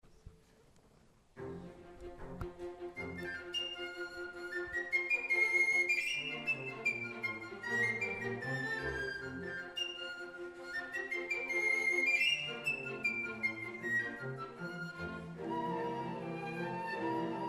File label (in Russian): Тамбурин для флейты-пикколо с оркестром; Госсек